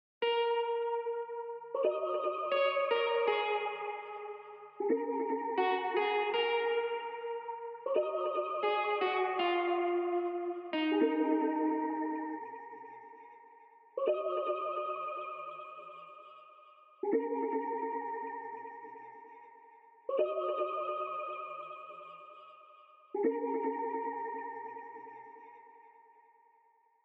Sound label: 157 BPM D# Min Guitar; RnB; Melody; Loop Stems; Cymatics; 2022 Melody Collection; Free Your Mind